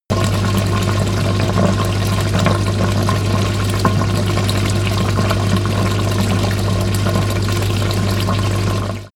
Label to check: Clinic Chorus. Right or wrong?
Wrong